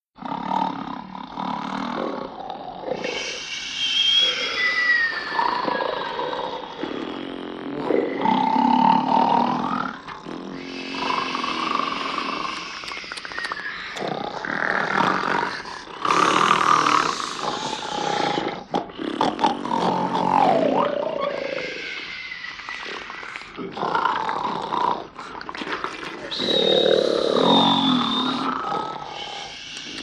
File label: Group Exagg Snores